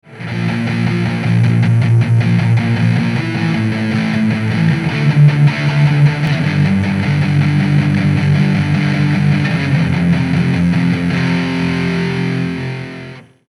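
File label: distortion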